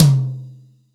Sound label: kit, Yamaha Motif-ES8